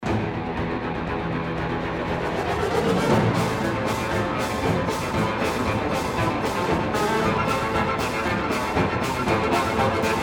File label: Viola Concerto 3rd mov